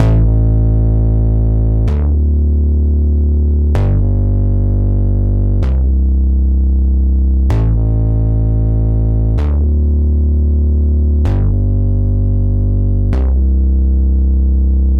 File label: Poly Bass